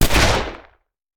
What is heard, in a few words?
Hand Gun; Sound Ideas; Guns